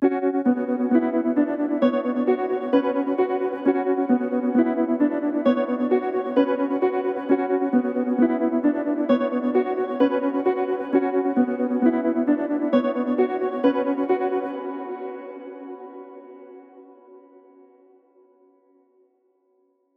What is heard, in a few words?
132 BPM B Min Tremolo Piano; Trap; Cymatics; 2022 Melody Collection; Atlanta